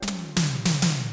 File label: ZOUK FILL, DRUM FILL; Crypton - DrumBase5000